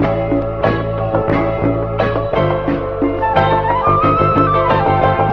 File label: keys and flute, phonky loops